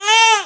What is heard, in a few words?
yoyo damage; free 2 play